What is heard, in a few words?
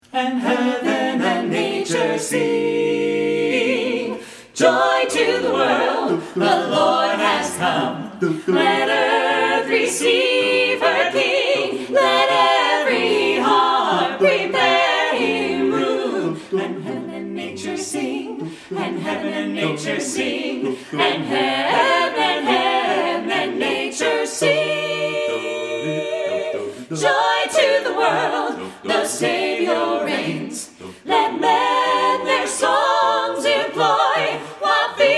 Carolers; Joy to the World